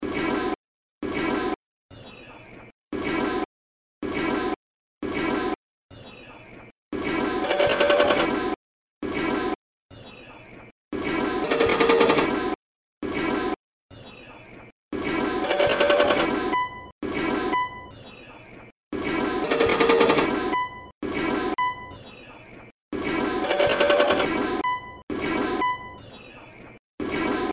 Bathroom Rhythms

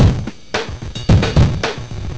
3 drums

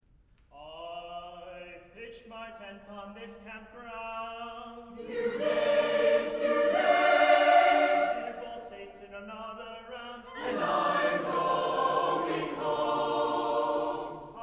Choir; MIT Concert Choir

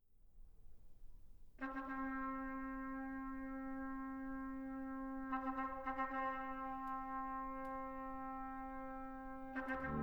fanfare

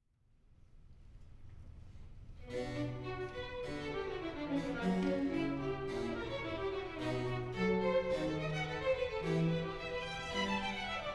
Recitative and Chorus; Now strike the golden lyre again

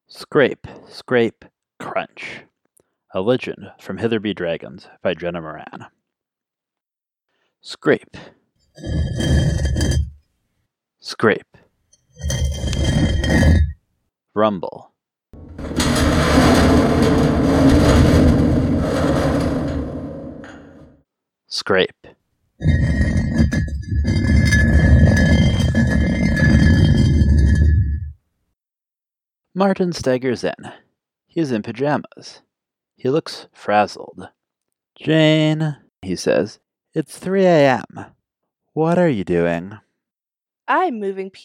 Scrape

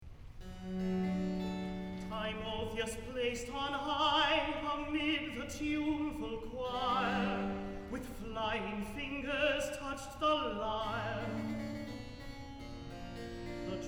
Recitative; Timotheus plac'd on high

